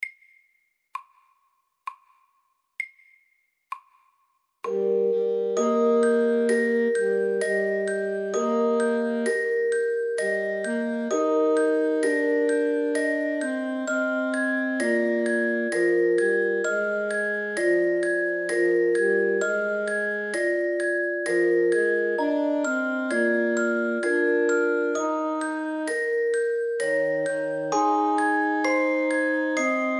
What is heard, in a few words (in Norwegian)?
Vuggesang SAKTE